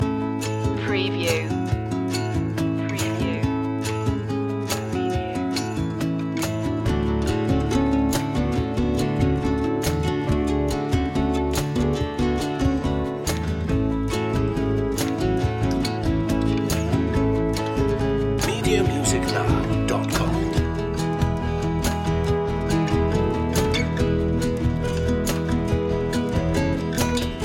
postcards loop

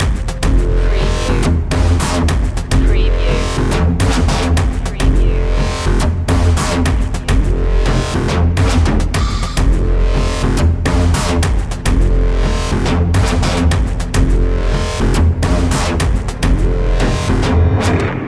distorted reality loop

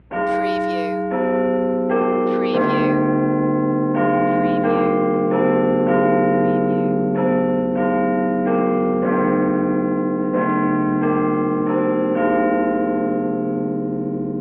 Big Ben Full Hour

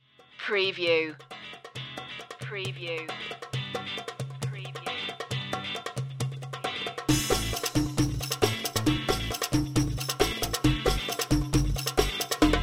dance party oriental no leads

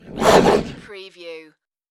dog monster growl

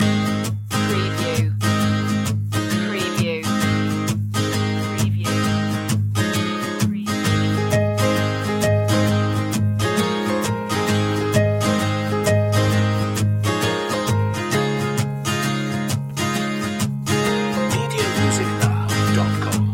happy faces loop

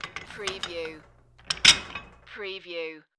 Weight Bench Change; sound effects bible

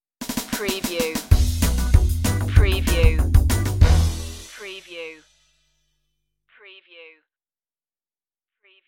the spooky pumpkin head stinger

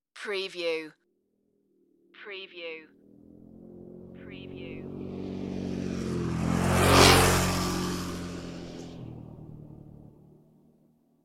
motorbike passing

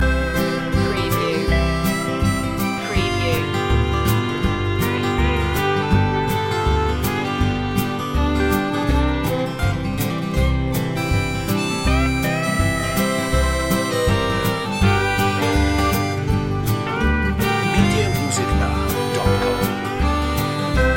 country loop

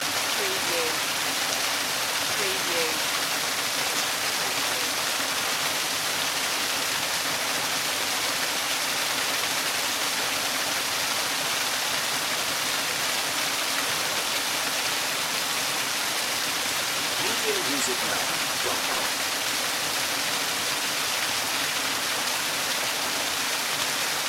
small waterfall

